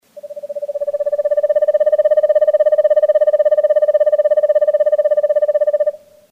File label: Owl Eastern Screech Trill